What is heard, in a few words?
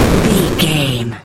retro explosion general